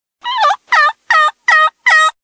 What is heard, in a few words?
Fall Turkey